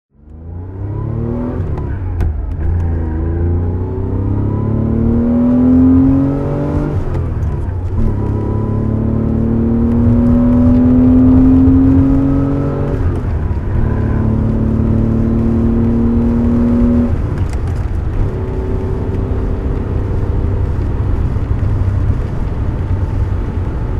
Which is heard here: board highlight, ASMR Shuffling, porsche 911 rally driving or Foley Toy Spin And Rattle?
porsche 911 rally driving